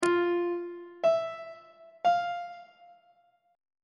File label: e sharp 8th intervals on treble clef